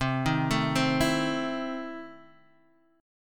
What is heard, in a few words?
E Major 7th Suspended 4th Sharp 5th, C